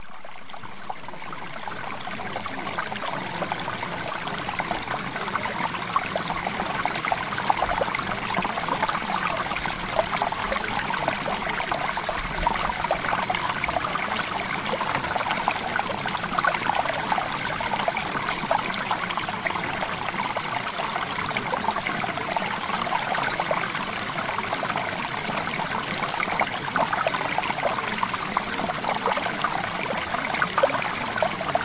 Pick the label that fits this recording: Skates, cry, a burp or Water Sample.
Water Sample